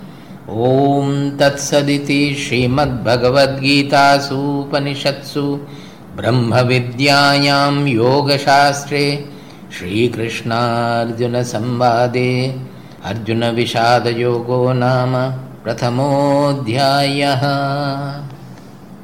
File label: Ending Chanting; chapter 1 arjuna vishada yoga; bhagavad gita; conclusion